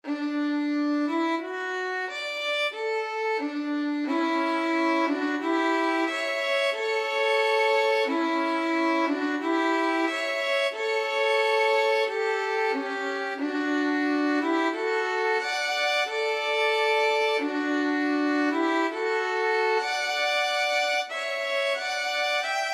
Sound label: jodler violin duet; pongauer